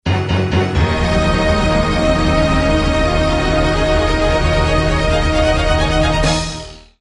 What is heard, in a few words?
Dramatic Alert